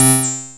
Anasound Short mid C, Industrial Analog Single Notes; Sonic Foundry (Sony Creative Software)